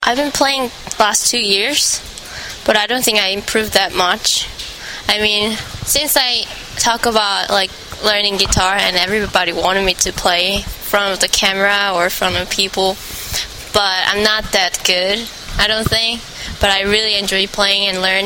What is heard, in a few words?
play her guitar